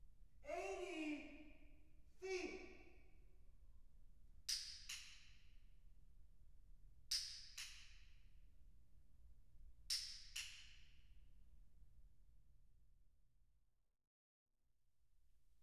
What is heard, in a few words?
Depth of Image, Acoustic Clicker: 80 Feet